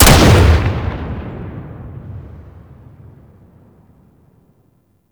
Gunblade Shot 3D, Weapons

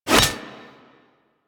Fishing Spear Line Retract Catch; Warframe; Weapons; Eidolon Fishing Spears

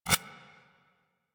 Storm Mark; Warframe; Warframes; Ninja

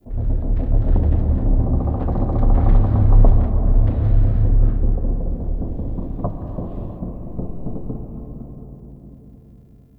Root Cave Low Creaks, Ambience; One Offs; Neathuns Root Cave

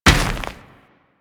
Moa Step Dirt, footsteps; Enemies; Warframe

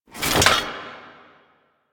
Generic Foley; Warframe; Hoplite

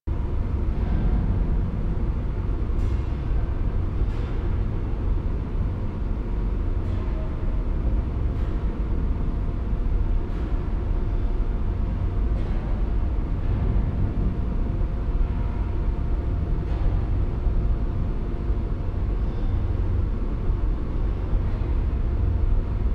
Ambience Loop, Interior; Warframe; The New War; Zariman

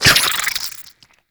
Infested Burst Rifle Reload, weapons

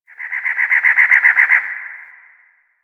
Cactus Wren Bird; Warframe; The New War; Zariman; Apartment

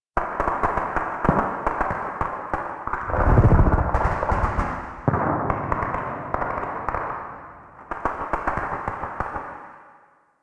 Exterior Void Energy Crackle, Ambience; Warframe; Railjack

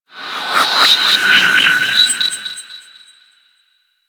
Bark, Enemies; Warframe; Teralyst; Vomvalyst

